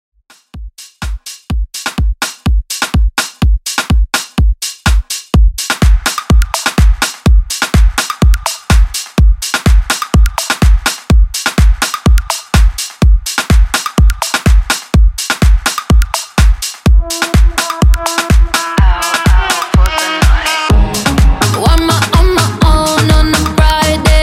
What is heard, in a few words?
Club House 125Bpm; Top40